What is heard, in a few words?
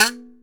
Kalimba B2; EMU Planet-Earth